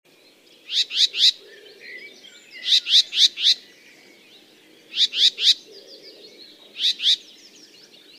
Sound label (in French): fauvette grisette